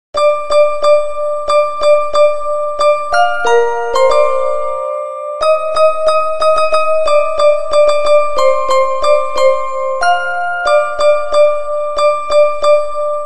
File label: Jingle Bell Jingle Bell Xylophone Instrumental Ringtone; english instrumental ringtone